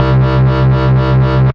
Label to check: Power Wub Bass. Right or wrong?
Right